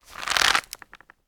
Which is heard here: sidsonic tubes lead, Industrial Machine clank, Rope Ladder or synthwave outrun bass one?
Rope Ladder